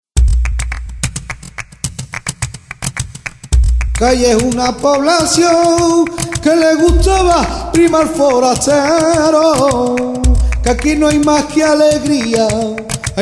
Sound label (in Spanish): bulerias cadiz